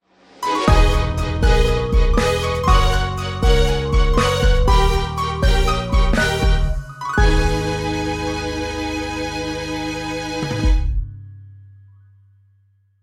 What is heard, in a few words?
Jingle Stage Start; Gensou Shoujo Wars: Dream of the Stray Dreamer